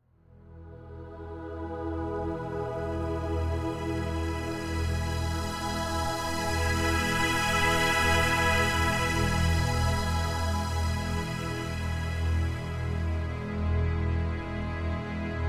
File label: Bonus